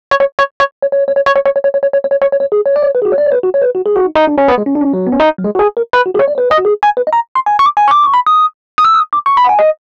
Rhodes Solo, Cinematic Funk

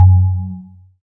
SYN WATER D, Microwave; Sound & Vision - Gigapack I CD 2

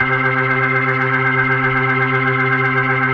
C3F, B3

